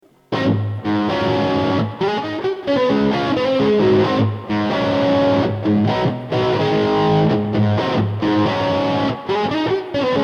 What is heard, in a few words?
rock